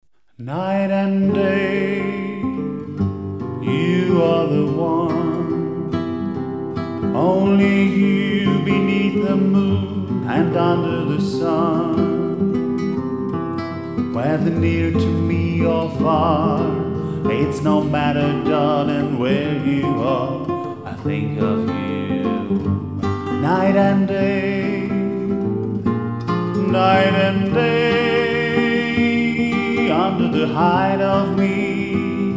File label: Jazz